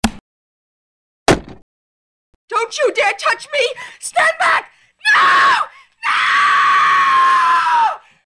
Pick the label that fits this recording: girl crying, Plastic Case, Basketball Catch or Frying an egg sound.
girl crying